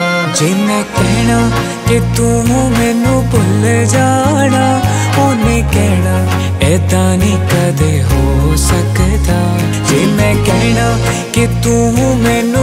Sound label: Cool Punjabi Song Ringtones